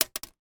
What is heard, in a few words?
stick insect egg drop in plastic tank; animals